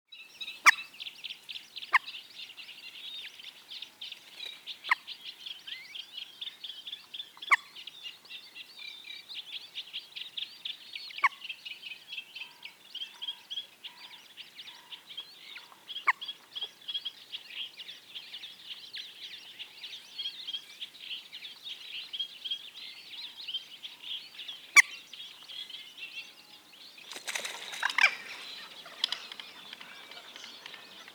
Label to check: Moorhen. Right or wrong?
Right